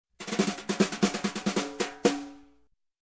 Fast Jazzy Snare Transition

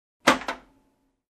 Steel Door close

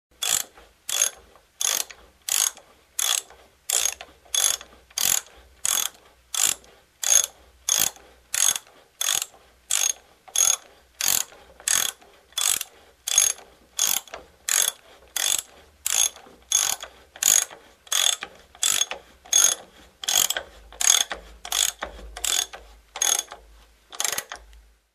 Construction

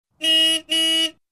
Old car horn two times

